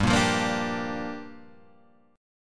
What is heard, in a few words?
G Minor 7th; Gm7 Chord {3 1 3 3 3 3} strummed